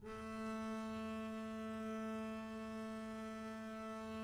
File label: a3 down, arco looped; meatbass